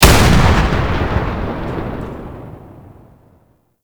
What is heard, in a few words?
Tank Cannon Shot; Enemies; Weapons; Lasrian Tank; Warframe